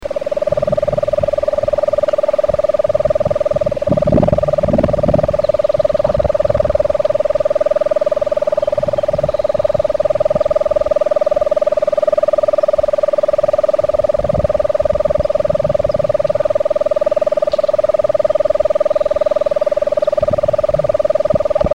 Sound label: Lesser Nighthawk